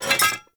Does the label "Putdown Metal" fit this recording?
Yes